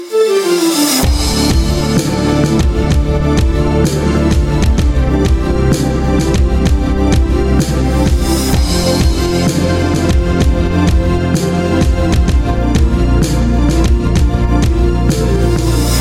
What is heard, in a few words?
Epic War Music